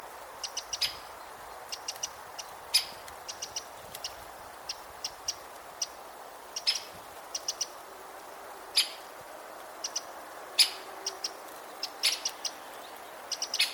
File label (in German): buntspecht ruf; vogelstimmen; nabu; lars lachmann